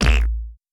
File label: funny cartoon fast splat